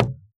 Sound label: Moody Park Playground Perc; Houston; percussion